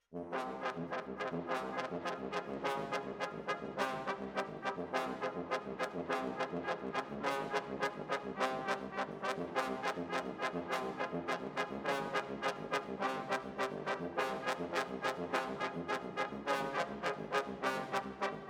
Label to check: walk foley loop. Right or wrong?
Wrong